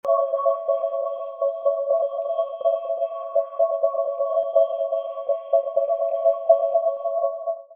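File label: Analog Atmos C minor 122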